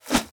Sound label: Whoosh